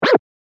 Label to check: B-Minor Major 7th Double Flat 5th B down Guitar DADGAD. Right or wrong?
Wrong